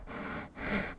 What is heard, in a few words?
horse breath; Mount&Blade Warband